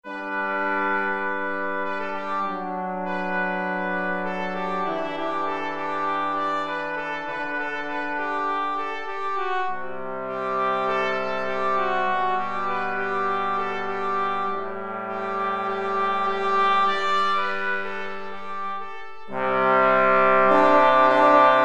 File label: brass